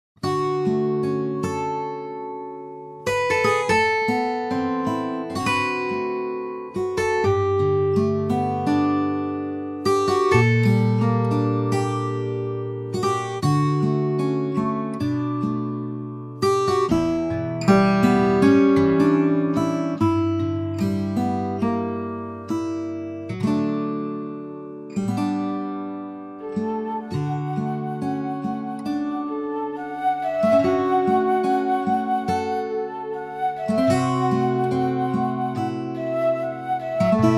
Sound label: guitar play D flute reference